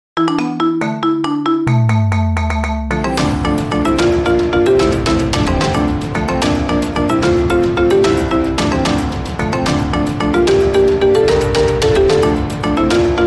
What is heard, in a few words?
marimba remix; jack sparrow